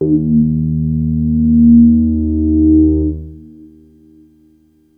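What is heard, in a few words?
bass D#1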